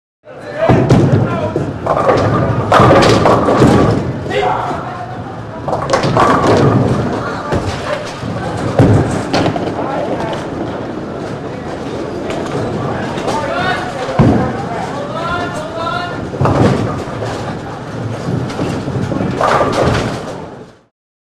Bowling Alley Ambien

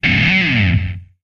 Music E Guitar Disto

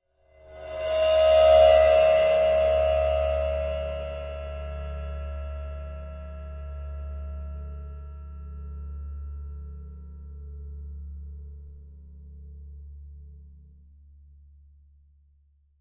Ride Cymbal Bow, cymbal & gong; Old Ride Cymbol Bowed 1

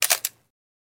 35mm Camera Shutter; shutters & movement

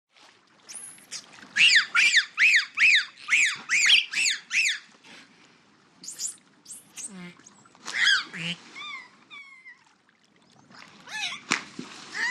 Dolphins Splashing

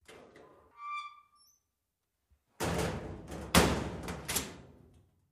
Locker Slam Mediu

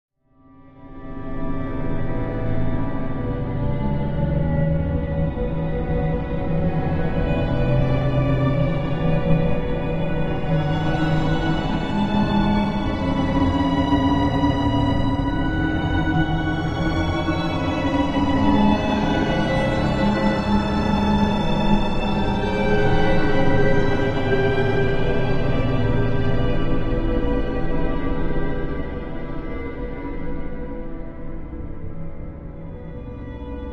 Music Eerie Slow, orchestral

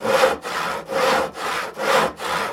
Hand Saw On Pine

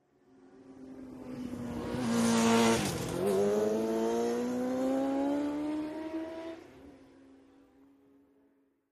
Motorcycle Road Racing

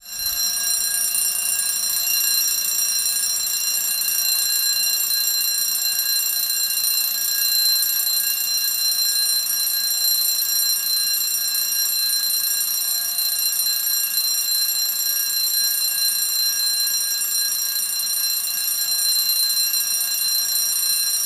Fire Alarm Lng Corridor

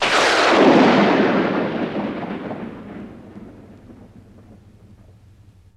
Lightning Bolt Thun

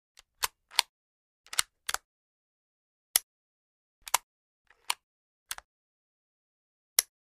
22 Ruger Rifle Cock; foley gun movement

